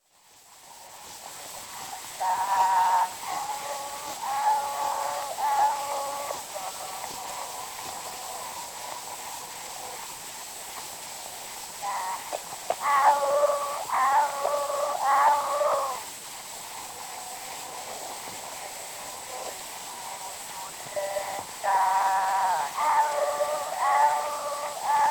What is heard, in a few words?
Zulu Recordings; Father Franz Mayr; Ihubo lempi